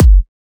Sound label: Kick, Kicks; FLPRO